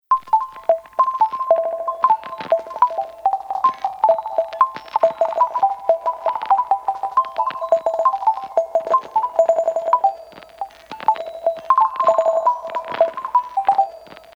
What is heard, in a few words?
hurdy gurdy